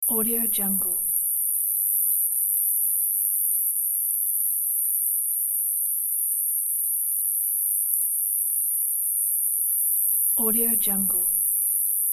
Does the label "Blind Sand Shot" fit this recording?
No